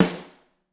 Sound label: SN Overhead, Snare; Ableton Foundation FreePacks; Snare Designer